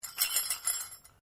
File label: Bell Handbell Priest Japanese Ornamental Rings Rattling; The ornamental rings on a Japanese priests bell; Bells